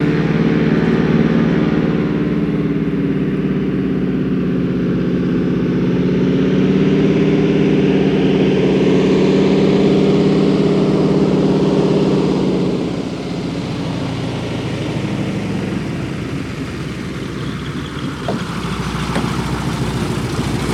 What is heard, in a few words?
Prop Plane DHC, de Havilland Canada, Coming In And Touch Down